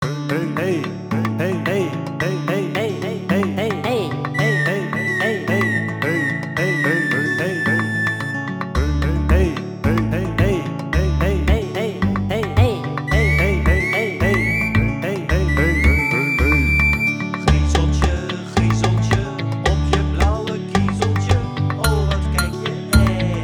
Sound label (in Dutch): claves en triangel